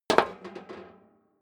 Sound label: step high heels metal rattle single stair down; schritte